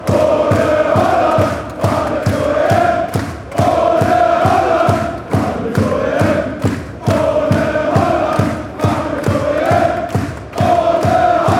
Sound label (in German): ohne holland, chants